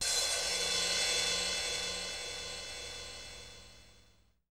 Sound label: MALLET CYM; Hollywood Edge; The Big Bad Beatmachine